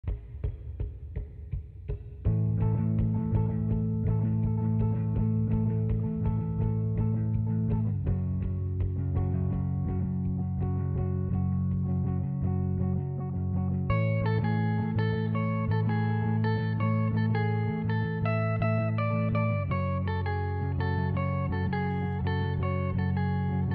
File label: guitar cover